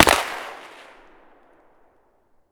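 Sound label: fire far, shotgun; weapons; BOITO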